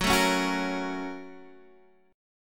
Bb Minor Major 7th Sharp 5th, Gb

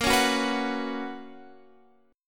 B Major 7th Suspended 2nd, chord

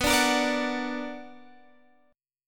B Minor Major 7th, Guitar, Standard; strummed; JGuitar

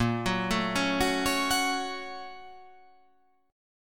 A sharp Minor Major 7th Sharp 5th

